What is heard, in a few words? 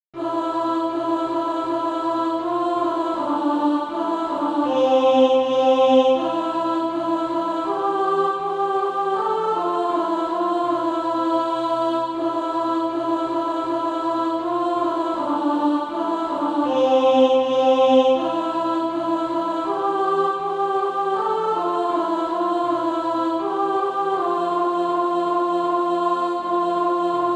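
alto, SATB Practise Music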